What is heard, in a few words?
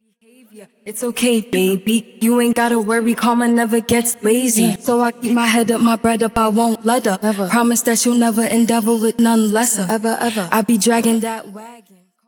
vocals